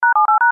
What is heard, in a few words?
DTMF RX LOWSPEED; Multilink 2018